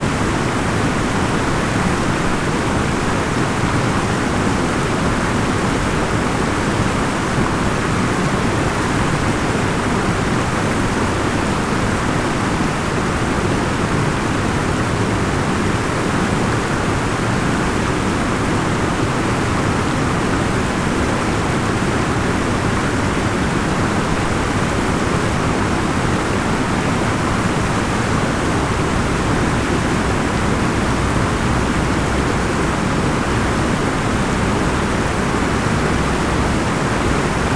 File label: water waterfalls 30 feet away pyrenees france